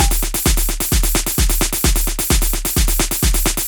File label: full on, edm drum samples